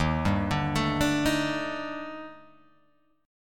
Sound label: D sharp Major Sharp 11th, Guitar, Open G